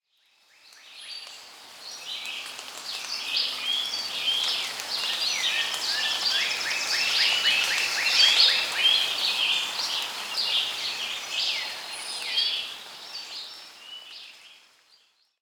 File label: Monkey Crowd; Pitch Black Audio Pong